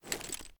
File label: charge raise, reloads; gmod